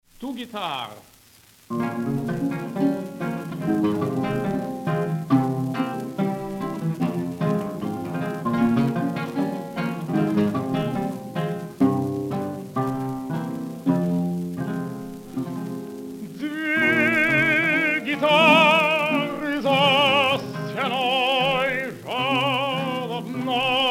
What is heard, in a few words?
Two Guitars